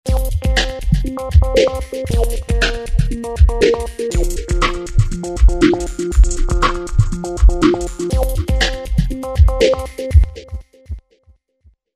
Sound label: Ze Inspektor, Roland V-Synth